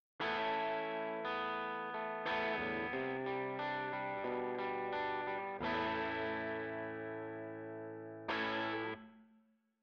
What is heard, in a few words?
ToneX Pedal Reverb Spring